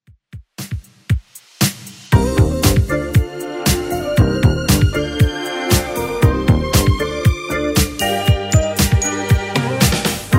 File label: 80s 117bpm; Redrum